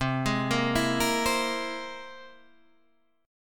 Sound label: C Augmented 7th C down; arpeggiated; JGuitar